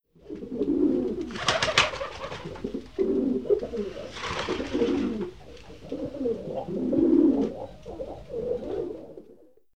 golebie; karty obrazkowe; kazdy potrzebuje milosci

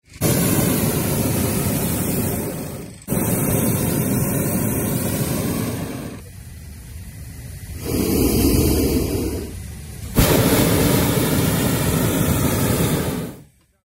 balon; karty obrazkowe; w drodze do celu

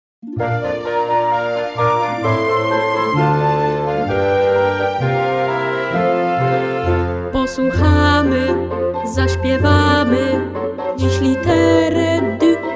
piosenka litera d; szkola marzen na tak; klasa 1

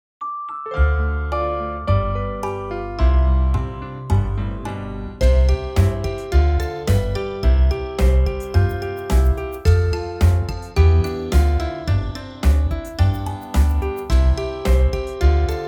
plas bez wokalu

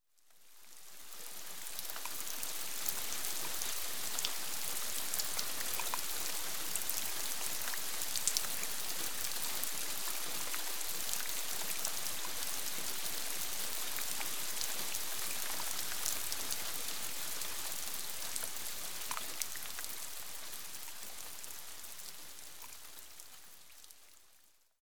deszcz kapuśniaczek; kalendarz pogody

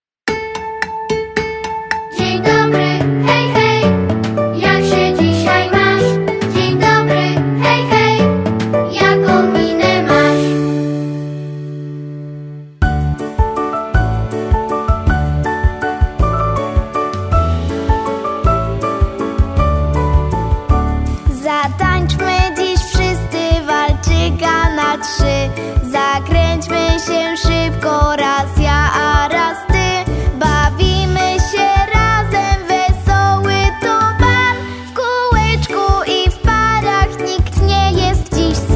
plas wokal; szesciolatki; multiprzewodnik

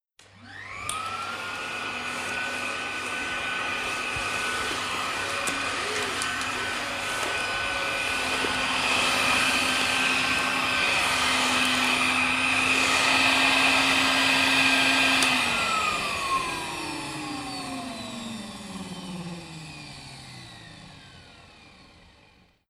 odkurzacz; karty obrazkowe

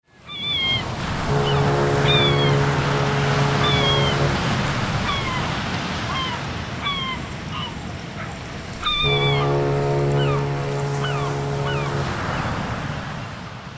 statek, karty obrazkowe; w drodze do celu

